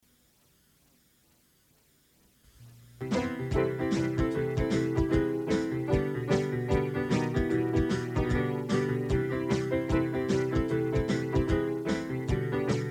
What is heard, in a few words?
motown